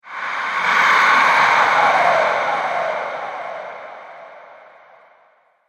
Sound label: Ghost Consumes; horror